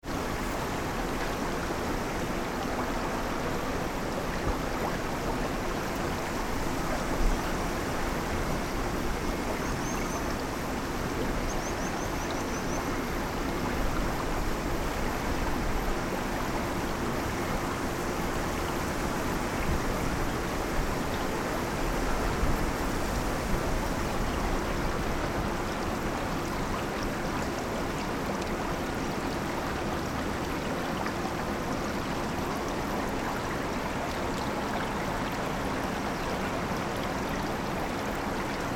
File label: river